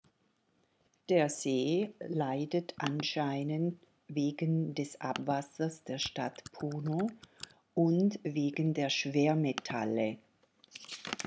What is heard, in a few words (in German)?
wasser; titicaca see